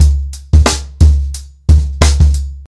120 bpm drum beat c sharp key, kick tuned to the C# note